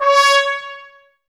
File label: BRS TPS C#0, Brass; 90 s Sample CDs; Miroslav Vitous; Woodwind Brass Ensemble